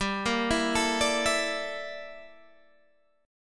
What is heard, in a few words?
G Minor 6th Add 9th G down Guitar Open G